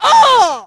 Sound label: uohh; Splashdown; XBOX; Umi